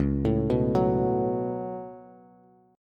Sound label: Db 7th Suspended 4th Sharp 5th Db down Bass; JGuitar